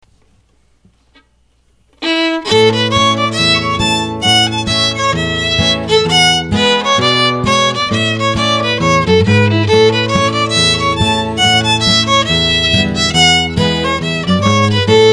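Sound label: Schottische Fiddle Guitar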